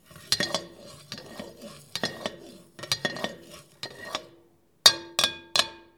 Topf rühren

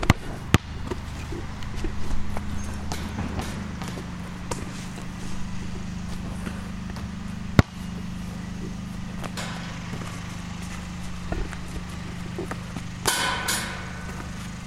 basketbalkorb

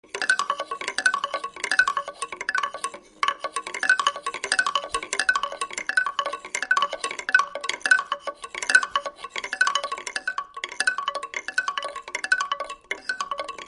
holzinstrument

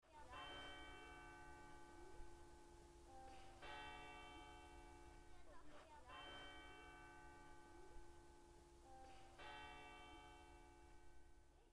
kirchenglocken

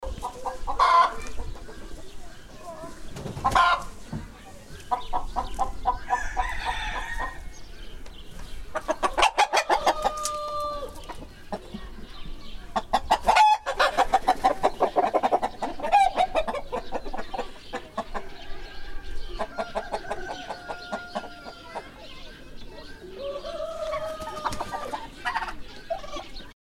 Hühner

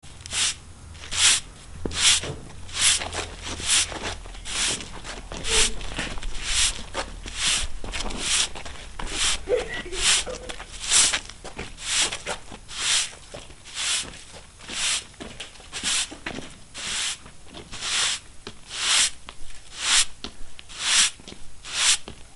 fegen

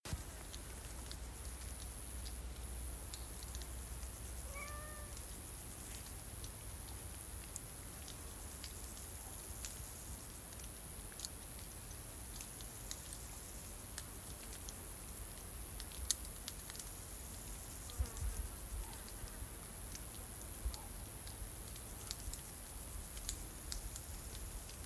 Sommer Atmo

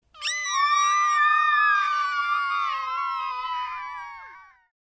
schreiende kinder hoch

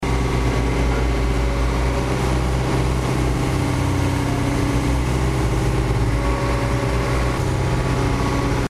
bagger

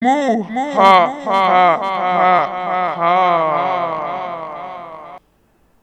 grusel lachen

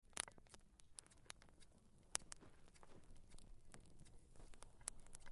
kleines feuer

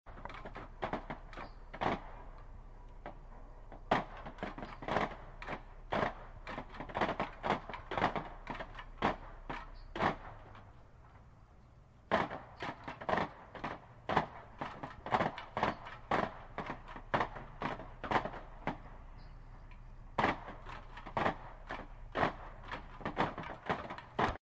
impro-instrumente gross distanz

